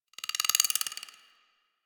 Foliage Insect; Warframe; Duviri

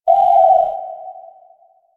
Owl Idle Chirp; Glyph Puzzle; Warframe; Duviri

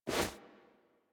Movement Foley; Warframe; Wisp

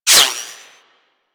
Bullet Whizzby; Warframe; Weapons

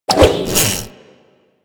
Zipline Pullback; Warframe; Gadgets

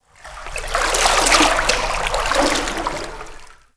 Ocean Water Rising Laps; Ambience; Warframe; Grineer Ocean

